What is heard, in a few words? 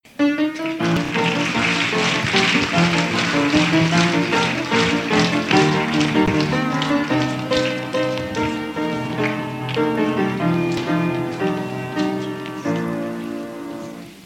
Musical Interlude